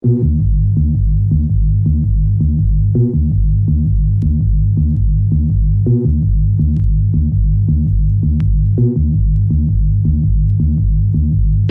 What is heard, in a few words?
iphone 4 dark ring tone